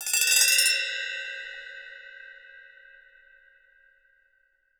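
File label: ASCENDING, BELLS CHIMES; Voice Crystal - Steve Reid Definitive Percussion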